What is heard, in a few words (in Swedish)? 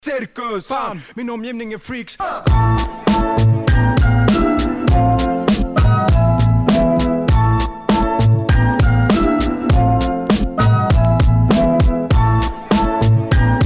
Cirkus instrumental